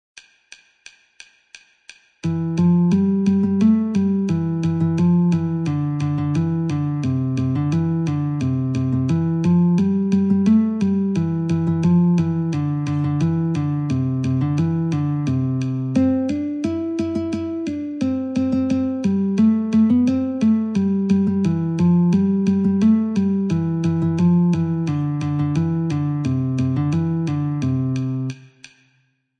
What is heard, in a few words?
Wild Flowers Guitar, metronome 88